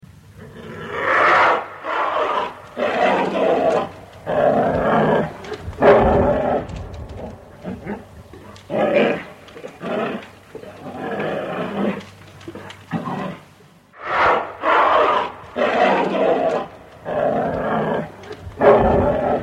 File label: Grizzly Bear; NPS